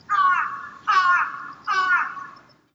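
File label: karasu, crow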